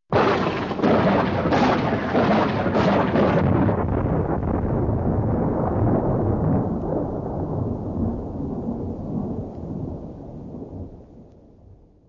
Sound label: Thunder Claps